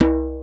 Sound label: Djembe Single Hit; percussion; musicradar